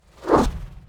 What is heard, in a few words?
whoosh, fast